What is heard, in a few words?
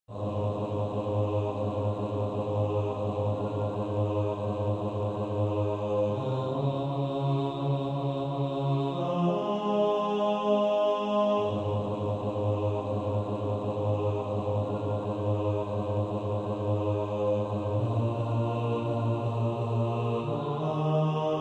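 bass, practice music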